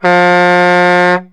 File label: truck air horn; Sound FX Real Life